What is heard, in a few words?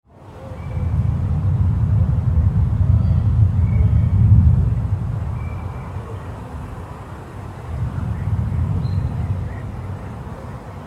Thunder rumble; افکت و طبیعت و حیوانات; جنگل